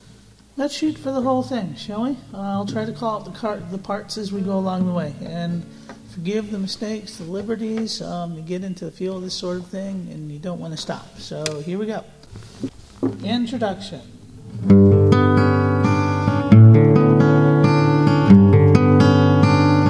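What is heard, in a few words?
open tuning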